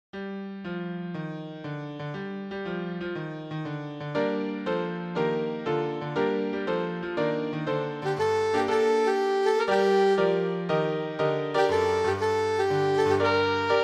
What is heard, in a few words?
Saxo song